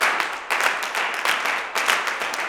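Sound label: CLAPS 96, Gospel; Blues & Soul; G.CLAPS 96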